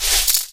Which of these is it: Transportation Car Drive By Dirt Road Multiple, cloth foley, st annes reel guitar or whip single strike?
cloth foley